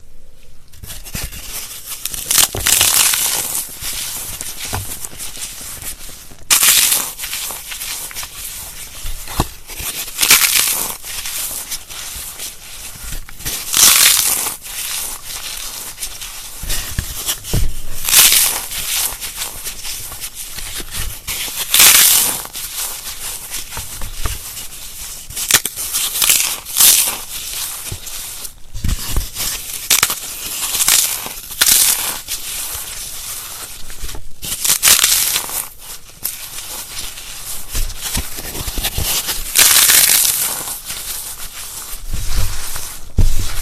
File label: biscuit asmr, خرد شدن بیسکویت